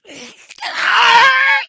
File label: shout, infected; become alert